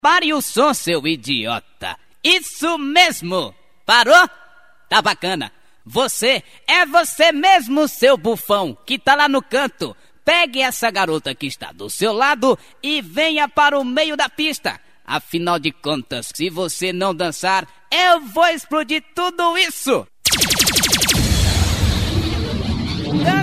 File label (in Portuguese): vinheta do esqueleto